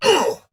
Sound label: Pain; Warframe; Dax Ashigaru; Battle Barks